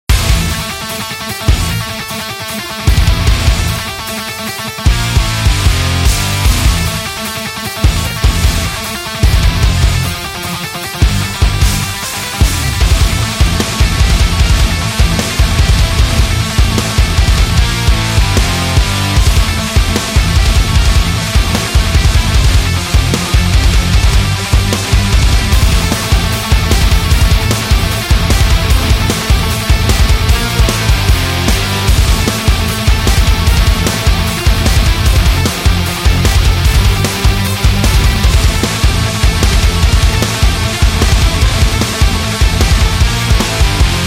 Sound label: In This Metal